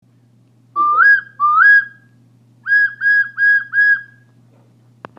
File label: cardinal